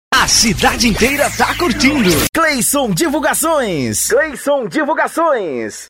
abertura

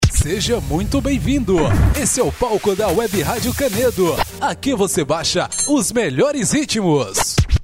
abertura

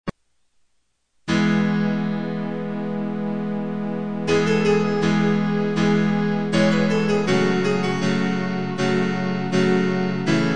forro

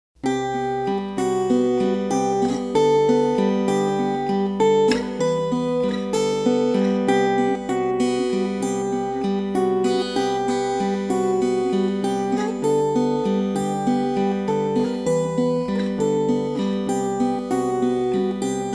caixinha de musica

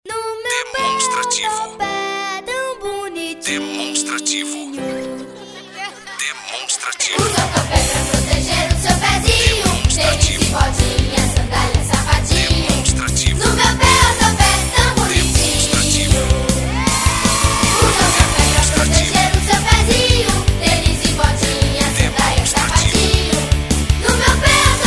jingles infantil; projeta jingles

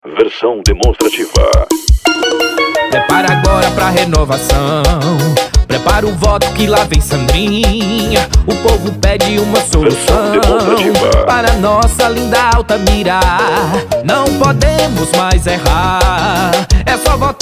piseiro; eleicao2020; musicas politicas 2020

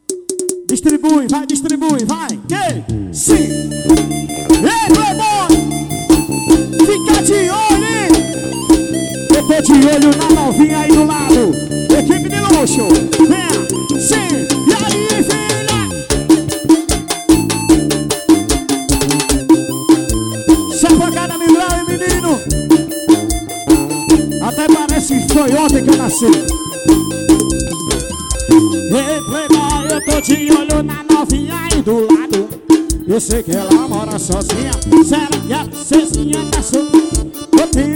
pancada ao vivo medios graves

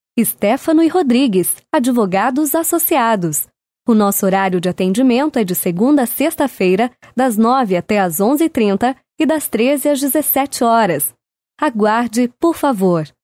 espera telefonica; advogados e associados

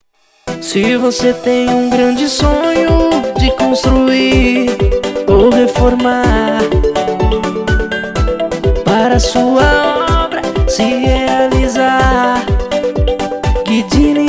jingle materiais de construção; guidini

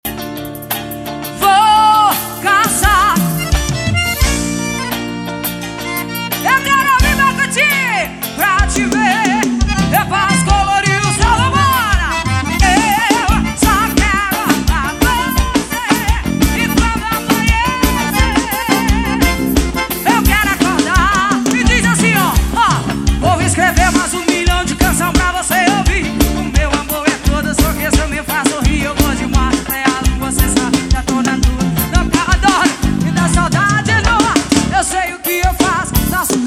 forro

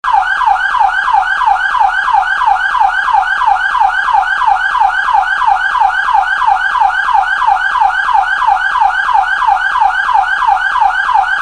sirenes yelp

